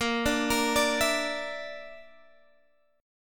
Bb Major Flat 5th, Guitar, Half Step Down

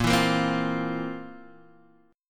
F Major 7th Suspended 4th A sharp

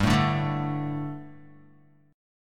G Suspended 2nd G down Guitar; Gsus2; strummed; JGuitar

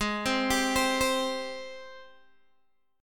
Ab Major 7th, Guitar, Open G; x,13,10,12,13,10; down

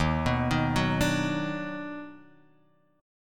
D sharp Minor Major 7th Sharp 5th

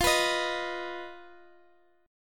B Major Flat 5th F

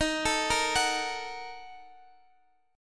Bb Major 7th Suspended 4th Sharp 5th, Eb, chord

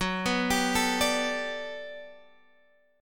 G Major 9th, F sharp

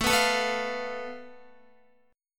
A Suspended 2nd Sharp 5th, Guitar chord; strummed; JGuitar

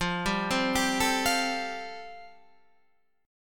G 7th Suspended 2nd Suspended 4th F chord

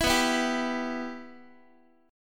B Suspended 4th Sharp 5th down Guitar; Bsus4#5 strummed; JGuitar

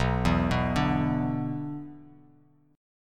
B-Minor Double Flat 5th B down, Bass Standard; Bmbb5 arpeggiated; JGuitar

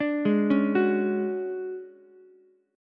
D Major 7th Flat 5th D down Ukulele; arpeggiated; JGuitar

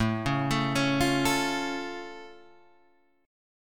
A Augmented A; A+ Chord {5 4 7 6 6 5} arpeggiated

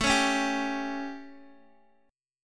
A 7th Guitar DADGAD, chord; x,x,7,6,4,5

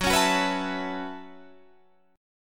B 7th Suspended 2nd Suspended 4th, chord

